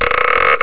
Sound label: stretch